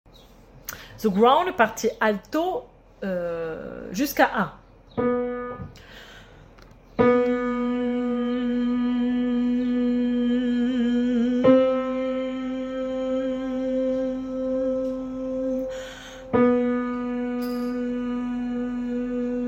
alto

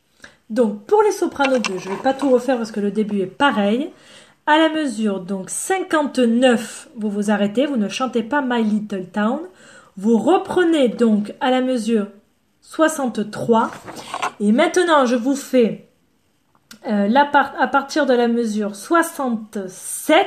soprano

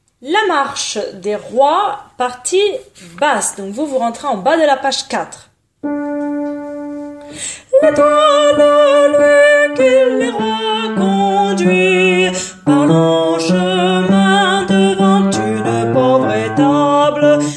La Marche Des Rois Basse; Bizet

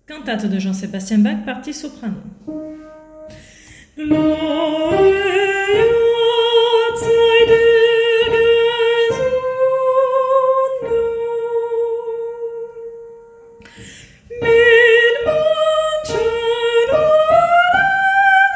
partie soprano

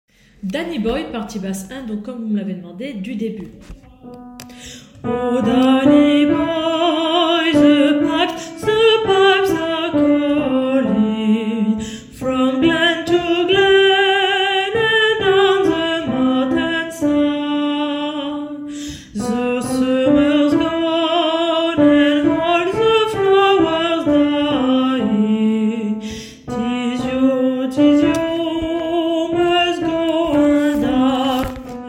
basse

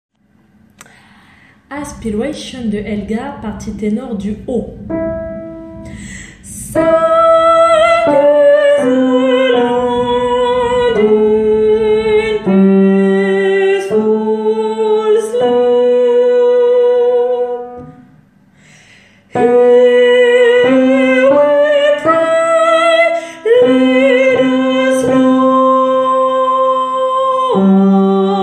Ténor haut; Aspiration

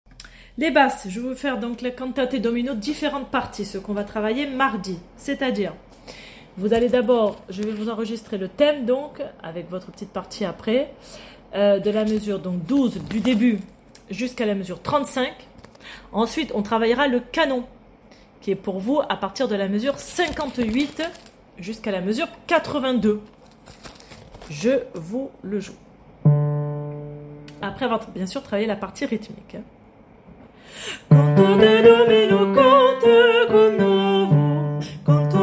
basses differentes parties; Cantate domino

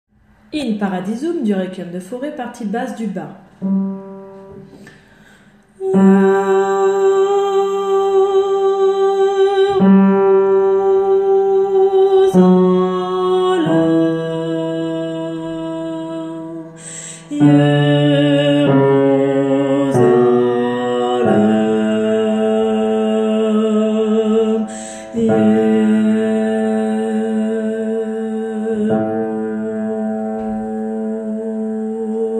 Basse bas; In Paradisum

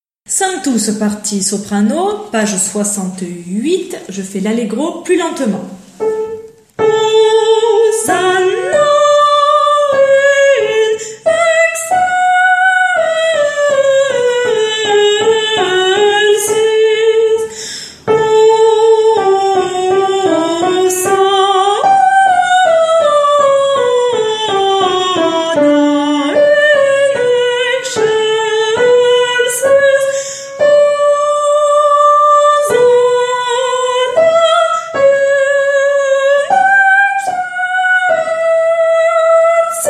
Soprano lent; Requiem; Sanctus; Mozart

